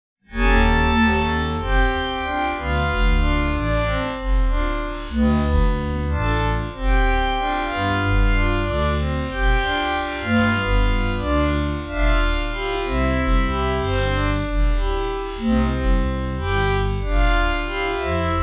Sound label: Jazz